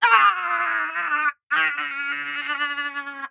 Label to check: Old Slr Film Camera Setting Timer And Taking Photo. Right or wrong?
Wrong